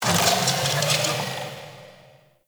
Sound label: distant machines; Warframe; Corpus Railjack; Ambience